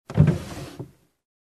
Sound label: Loose wooden sliding door opens